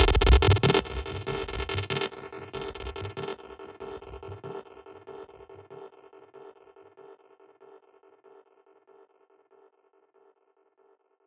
Grainy F 142 BPM, Psytrance Zaps & Filter Sweeps; Ghosthack; Psytrance Visions Volume 2

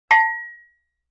Cowbell High; Vintage Cartoon; Drums & Percussion

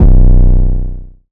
808 - B; 808s; Cymatics; Cobra Hip Hop Sample Pack

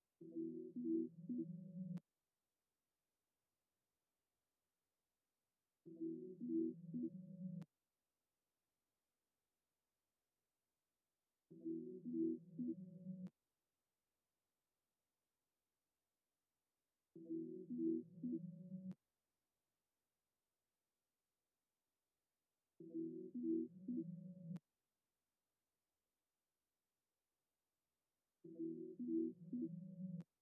170 BPM E Min Texture Synth Low, loop stems; Cymatics; 2022 Melody Collection Platinum Expansion; Touche

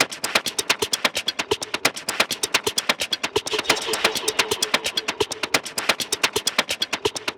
Perc Loop 130, techno

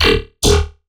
Riddim Bass Hit E; Wormhole

